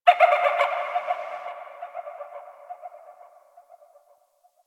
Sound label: Distant Zone One Creature